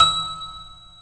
E 5 TOY PF, TOY PIANO; AKAI S6000 CD-ROM - Volume 1

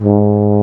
BRS F TUBA; Roland L-CDX-03

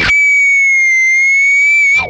whammy fx, guitar

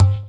EQ Perc, Percussion Mix

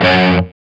G3 SOLDANO ROCK, Guitars; Spectrasonics; Hans Zimmer Guitars VOL-1

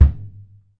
HAND MUTE, Surdo; E-MU Producer Series Vol. 2; More Studio Essentials; Composer

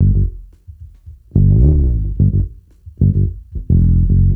Bass Loop, Ambient Grooves

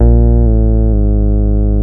BAS, 2 Step

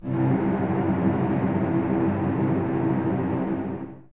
C 2, String Ensembles Cellos Tremolo; Miroslav Vitous

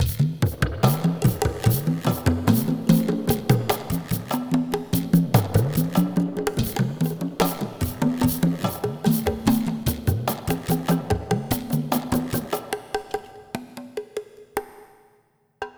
Jungle Vill Perc, Lounge Drumz

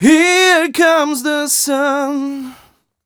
m 127 mel a, Vocals

